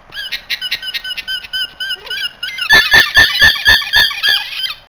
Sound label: alpaca sound; Alpaca Warning